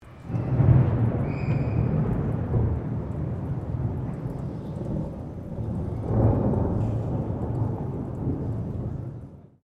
THUNDER MEDIUM CHILDS SHOUT STEREO, Severe hail storm; Weather